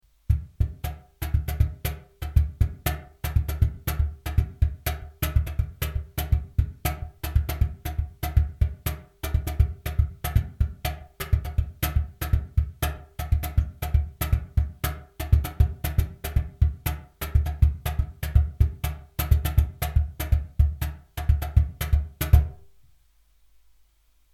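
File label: cajon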